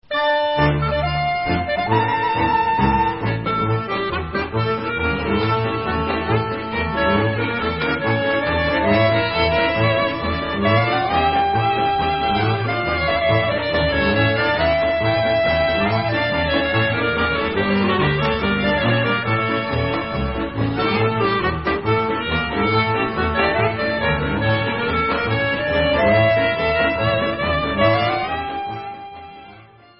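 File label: Folk Instrumental, Israeli Dances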